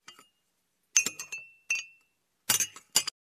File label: Glass mug and cutlery clink